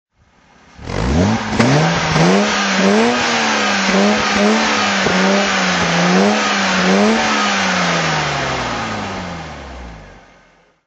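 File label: jaguar xjs40conv throttle, Engine sound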